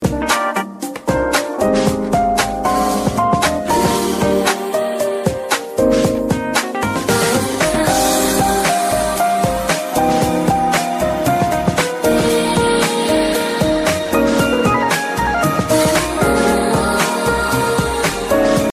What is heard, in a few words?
jazz tune, ringtones